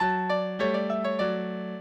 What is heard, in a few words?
minuet, mozart